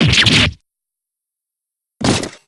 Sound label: fall